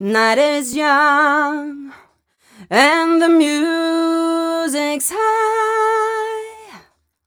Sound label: f mel d, fem Vocals